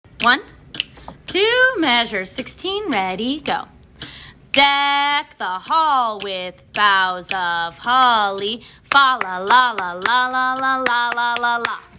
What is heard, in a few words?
rhythm sop and alto; deck the hall